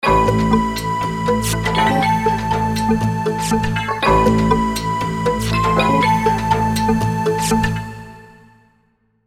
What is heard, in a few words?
Wavestation; Pharoah's Jig